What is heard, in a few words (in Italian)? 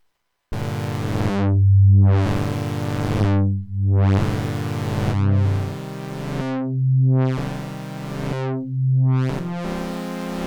Suono generato con X LFO; Sonicware; Liven XFM